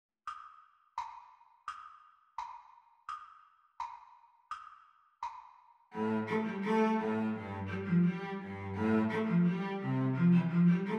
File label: Cello Duet Playalong Exam Speed; The Teacher part